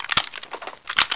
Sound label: glock reload; XDM